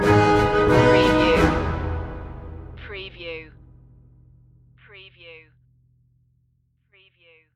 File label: ominous forces stinger